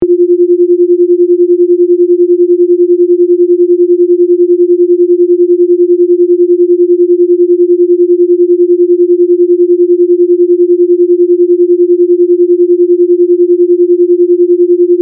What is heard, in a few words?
beat